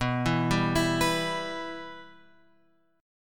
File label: B Suspended 4th Sharp 5th B; Bsus4#5 Chord {7 7 5 X 5 7} arpeggiated